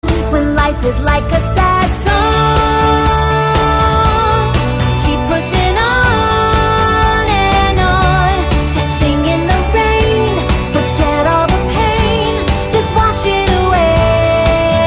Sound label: Sad Song